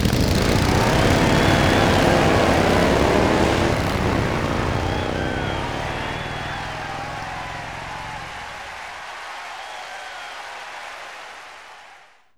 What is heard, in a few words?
Go Cheer; Harley-Davidson: King of the Road; arcade